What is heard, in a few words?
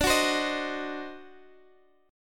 D 7th Flat 9th D down Guitar Standard; D7b9 strummed; JGuitar